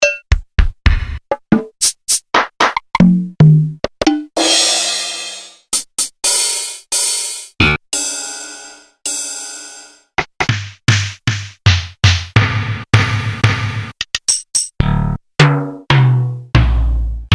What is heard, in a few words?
all waves, korg ddd 5; polyphony: 12 voices are available